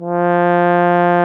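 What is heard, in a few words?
brass baritone; Roland - LA Composer vol. 1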